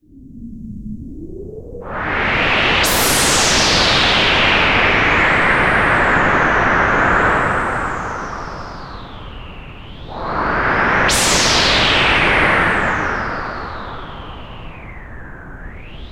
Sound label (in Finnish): aallokko; nord modular g2